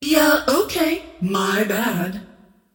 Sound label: scream whiff; queenofpain